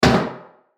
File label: Garbage Can Lid Close Bathroom Metal; Office; Blastwave